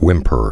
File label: whimper